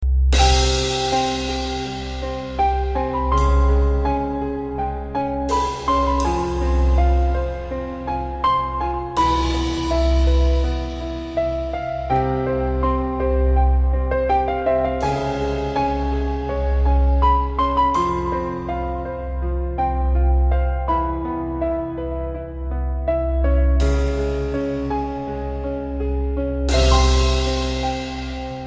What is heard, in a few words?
Soothing Piano